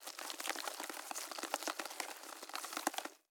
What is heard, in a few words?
bug movement